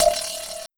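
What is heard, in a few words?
HH, hi-hat; Sonic Foundry (Sony Creative Software); Esoterik Beatz; Quesy Kit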